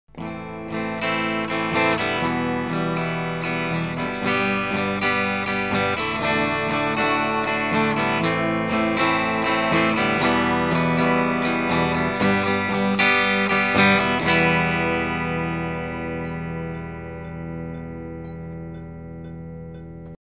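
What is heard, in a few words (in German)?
Horizon Akkorde geschrubbt, ESP Horizon, Clean Akkorde geschrubbt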